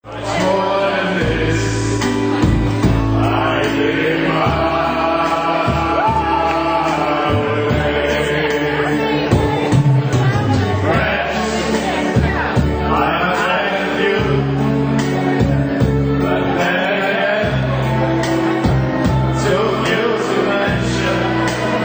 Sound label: karaoke; costarica